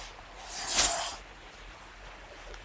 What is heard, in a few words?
Lick